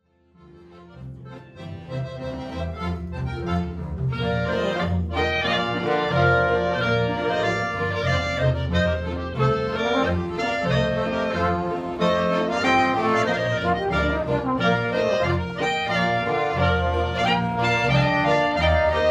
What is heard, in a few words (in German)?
Boarischer